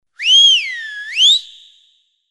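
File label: attention whistle, notifications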